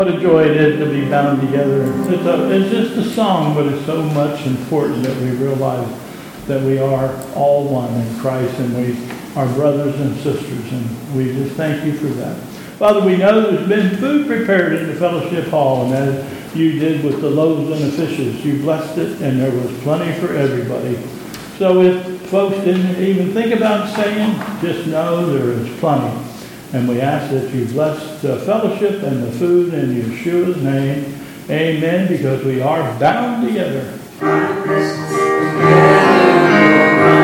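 Benediction, Dinner Prayer, Closing Chorus, church service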